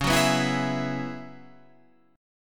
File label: F sharp 7th Suspended 2nd Suspended 4th, C sharp